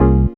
synth chord, dance synth; Sound & Vision - Gigapack I CD 2 (Roland)

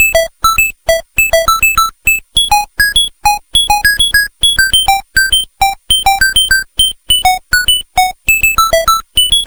Game Boy Synth

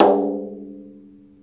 MalaiyuDrm; Club-50 - Foundations Roland; Exotic Drms

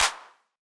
Yamaha DJX 2B, Hand Clap

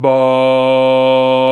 VOX, Male Scats

